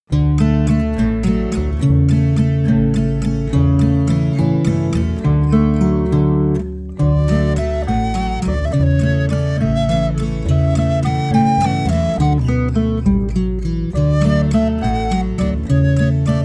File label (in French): Le blaireau Version instrumentale